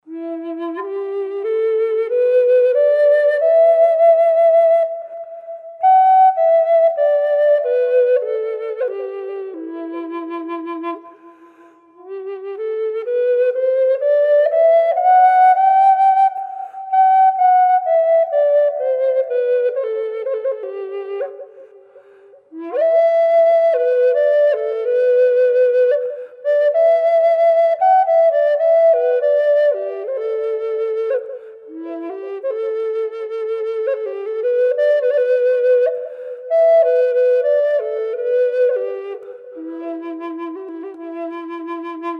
em side blown tiger maple wet; flutes

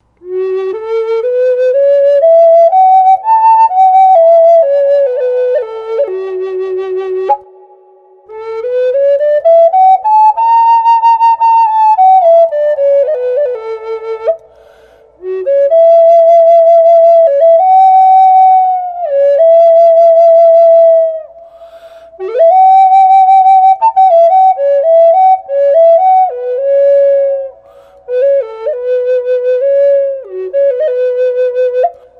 FSharp Flute Eastern Cedar; standard flutes; eastern aromatic cedar

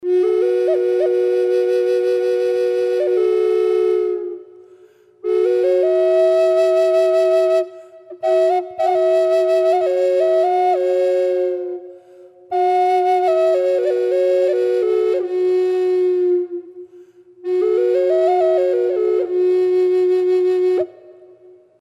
F Sharp Drone, flutes

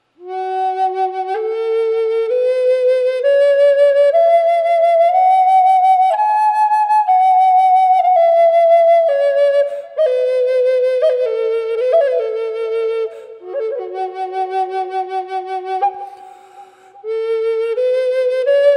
movingui f sharp wet; flutes

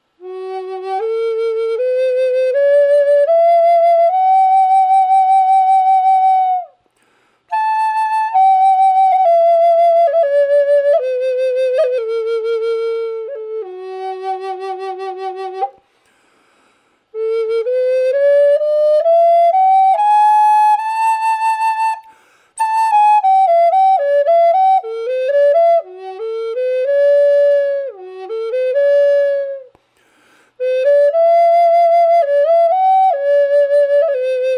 sapelle f sharp dry, flutes

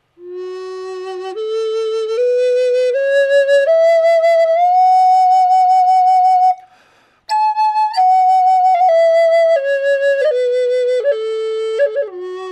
spalted mandarin f sharp dry, flutes

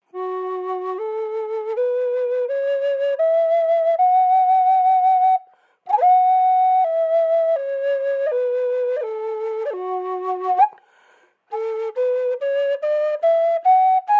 F Sharp m Backpack Un Processed, flutes

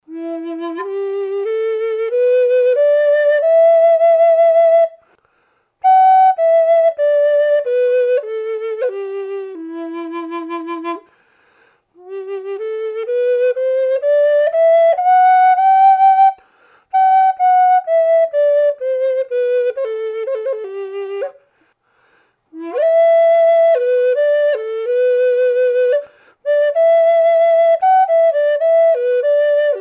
em side blown tiger maple dry; flutes

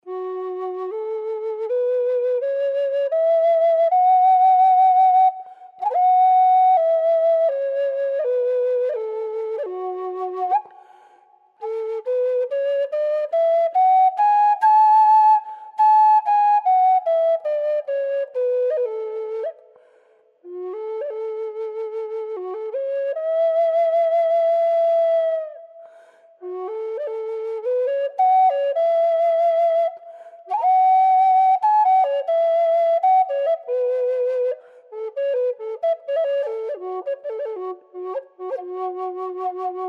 F Sharp M, flutes; Backpack; Processed

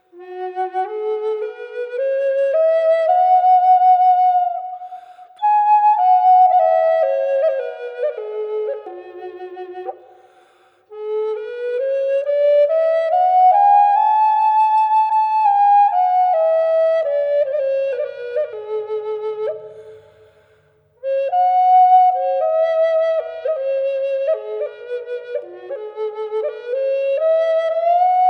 maple burl f sharp reverb, flutes

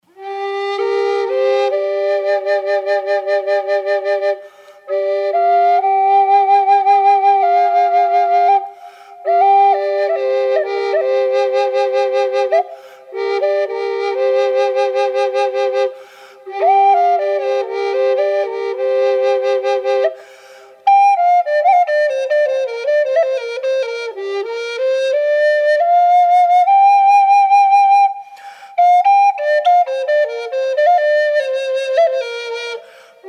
Gm Birdseye Inlaid Drone Wet, flutes; drones

